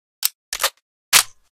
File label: unjam, weapons; MP5 Reanimation; librarian mplimo